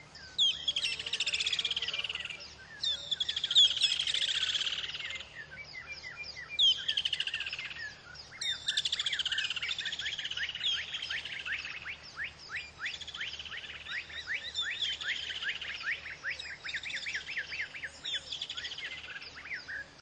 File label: birdsong; Bombable; flightgear; yasim